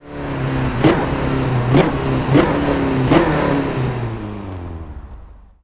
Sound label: throttle off, vehicles; gmod; tdmcars; vw golf gti 14